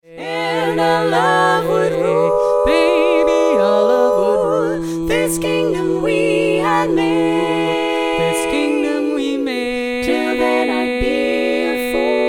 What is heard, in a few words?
Female